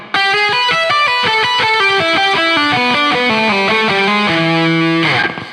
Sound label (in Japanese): ギターフレーズ; プロっぽく聴こえる